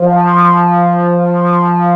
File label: AN SYN, SYN & LEAD; SAMPLE WAVE MIXING 2